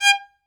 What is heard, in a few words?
Synclavier Strings, SOLO VI; ILIO